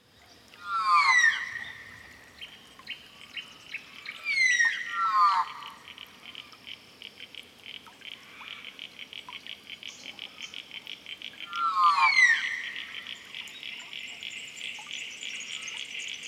Australian Magpie Gymnorhina tibicen

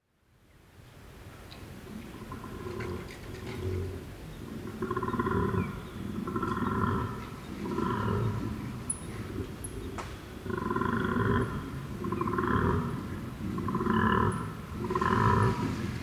Koala Emu Creek Night; Benarkin

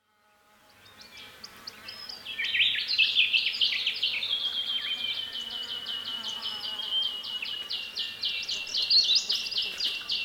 Rufous Whistler Pachycephala rufiventris

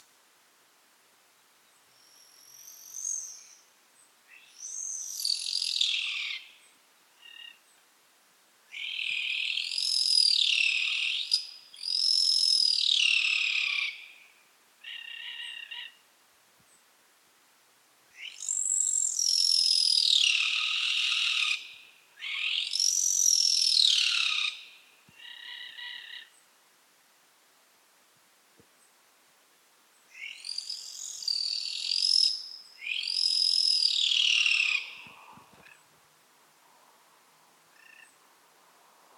Greater Sooty Owl Tyto tenebricosa

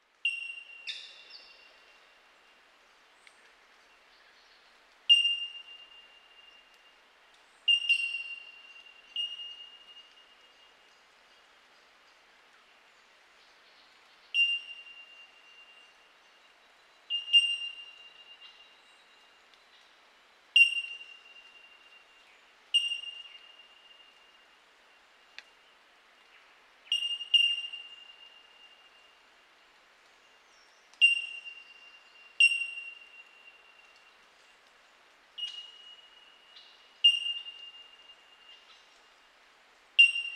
Bell Miner

